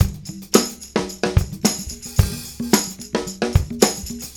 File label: Combo, R&B Drums